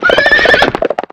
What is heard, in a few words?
Horse Move